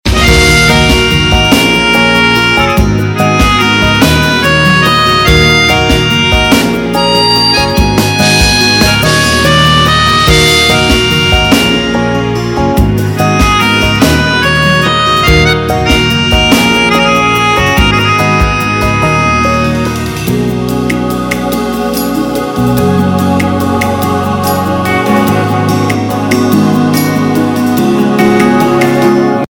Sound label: Piano Theme Ringtone; Bollywood; Shab